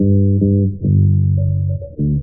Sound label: bass, Loops Phonky